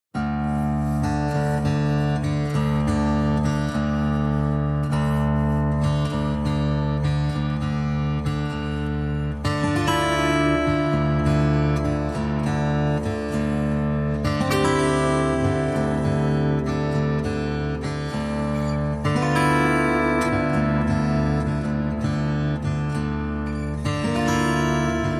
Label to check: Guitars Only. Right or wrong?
Right